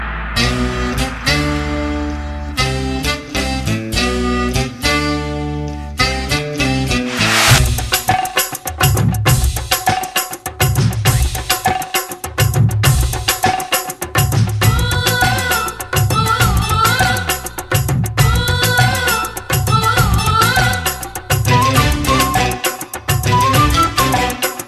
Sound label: Tamil Gana